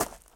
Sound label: gravel step; Golden Crayon Sounds